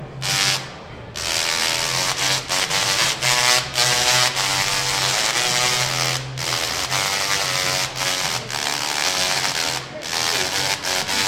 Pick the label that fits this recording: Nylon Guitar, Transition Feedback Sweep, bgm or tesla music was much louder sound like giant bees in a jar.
tesla music was much louder sound like giant bees in a jar